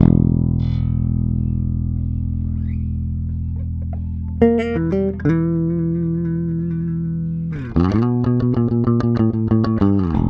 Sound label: Rock, Bass; Bass X